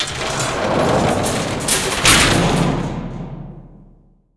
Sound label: elevator gate up; ambient; Half Life 2; Episode 2; outland